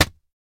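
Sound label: Dictionary Slam Close, books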